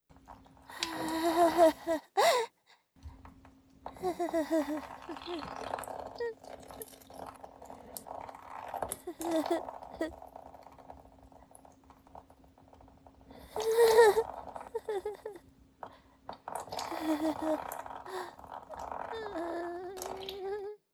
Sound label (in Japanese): 放心と放尿